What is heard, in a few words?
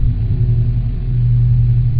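upper hallway ambience; hl2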